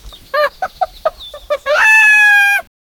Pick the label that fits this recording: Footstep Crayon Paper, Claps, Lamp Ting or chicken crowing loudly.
chicken crowing loudly